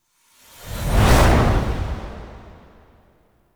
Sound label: Countdown Whoosh; UI; Undercroft; Warframe; Duviri